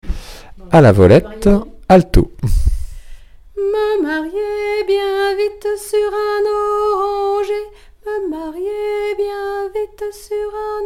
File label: alto; A la volette